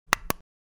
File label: Infographic button